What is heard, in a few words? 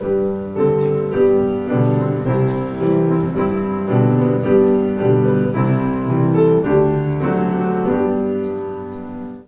Grand Piano Live